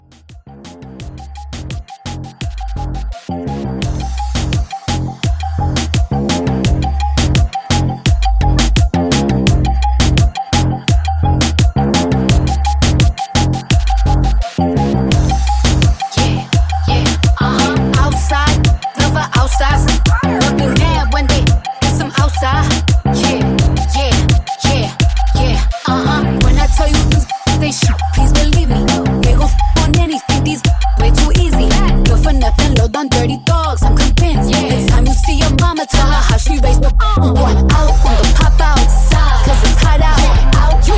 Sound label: Moomba Re Drum